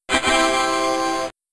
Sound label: Shutdown; Windows PDA 2.0 Shutdown; Windows Never Released Soundpack 1